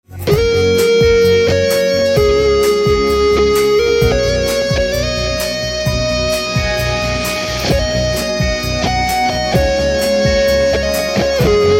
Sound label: Electric Guitar Instrumental Ringtone